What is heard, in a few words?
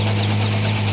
Aircrafts Engines Helicopter Propeller; DCS